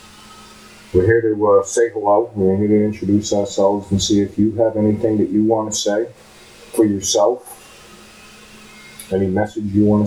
Jail cells - Woman singing

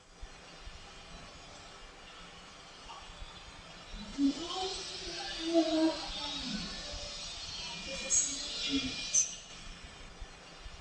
Singing in Suite

